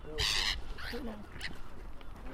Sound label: squawk